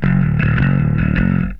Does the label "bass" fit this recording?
Yes